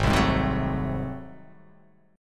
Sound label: G sharp 7th Flat 9th G sharp down, chord